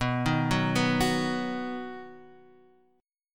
B Major B, Guitar, Half Step Down

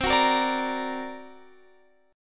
D Augmented 7th C, guitar

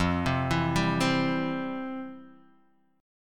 F Major 7th Suspended 2nd Guitar DADGAD; 3,3,2,0,3,x; down; chord shape

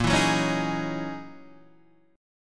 F sharp 13th A sharp

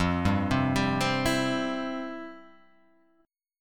F Major 7th Suspended 2nd F; arpeggiated; JGuitar

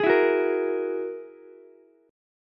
G sharp 7th Suspended 2nd Sharp 5th, Ukulele; G#7sus2#5; strummed; JGuitar

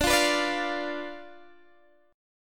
D Minor 6th Guitar Open D

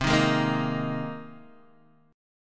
C Minor 7th Sharp 5th, Guitar, DADGAD; down; chord shape

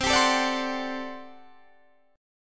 G Major 7th Suspended 2nd Suspended 4th C

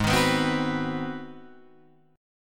G sharp 7th Sharp 9th, Guitar, Open D

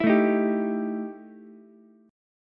E Minor Major 7th Double Flat 5th E Ukulele; strummed; JGuitar

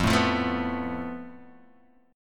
F Major Flat 5th, Guitar, Half Step Down

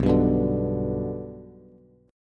B Minor Sharp 5th, Bass; strummed; JGuitar

